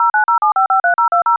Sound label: wave